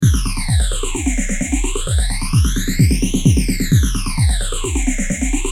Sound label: drumloop; boss ph3